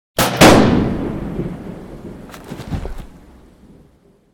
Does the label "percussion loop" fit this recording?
No